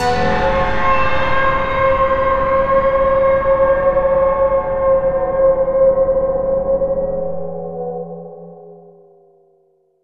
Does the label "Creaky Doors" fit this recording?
Yes